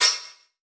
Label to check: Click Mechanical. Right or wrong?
Right